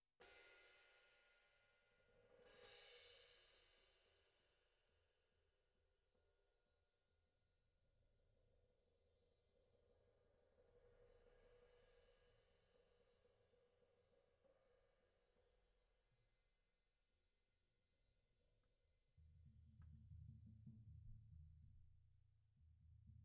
BD In

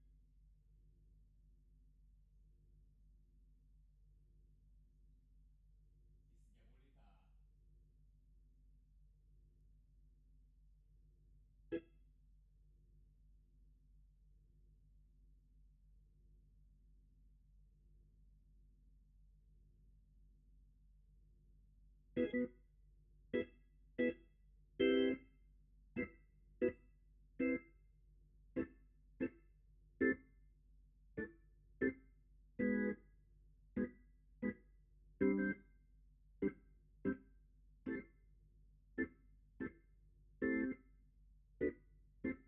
Hammond

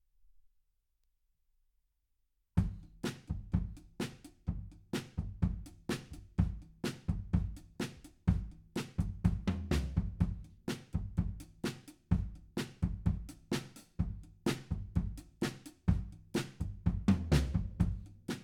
Room A

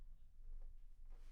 Bass Room

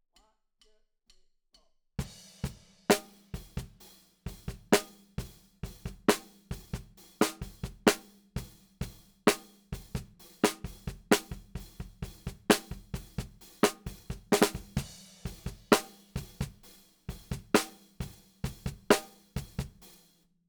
Snare Bot

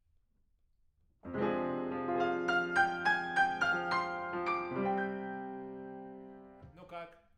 PIANO; TANGO

